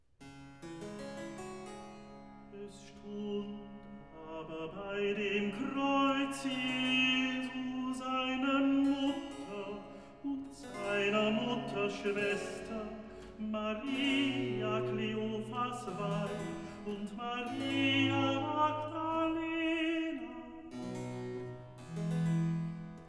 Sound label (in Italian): Recitativo, Arioso; Es stund aber bei dem Kreuz; Jesus Weib, siehe, das ist dem Sohn; Klassika; Handel Masterworks